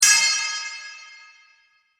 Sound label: anvil